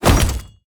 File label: League Change; mighty party 2017 gamerip pc ios android